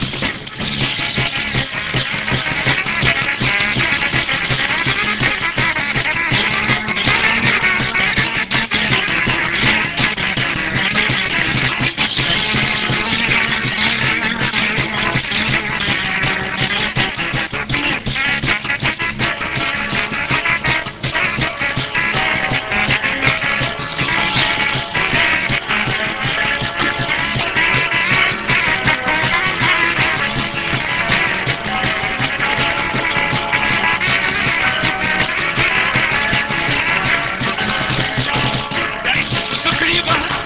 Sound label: trumpet